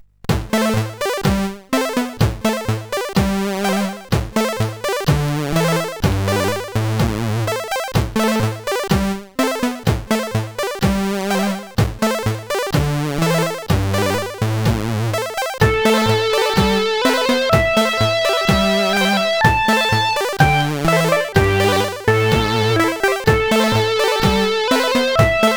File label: sid CSG8580R5